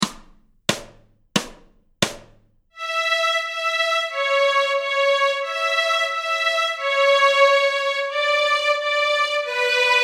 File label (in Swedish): Lilla snigel Stråk A, Fiol